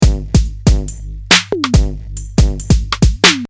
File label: ragga beat; Loop Mania Sampler Pack